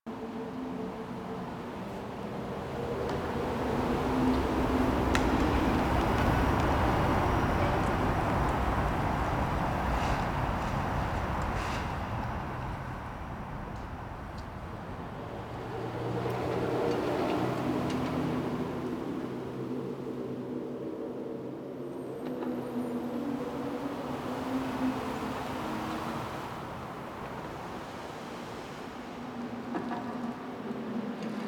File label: Winter Exterior Medium Bed Loop; Warframe; Ambience; Nineteen Ninety Nine